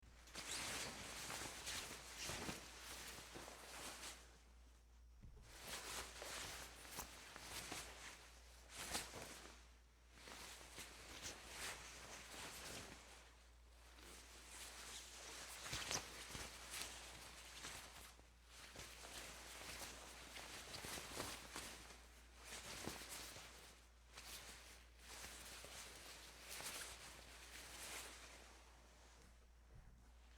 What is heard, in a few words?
hanging wet sheet